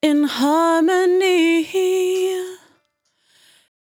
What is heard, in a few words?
100bpm Bm, Velvet Vocal; Zero-G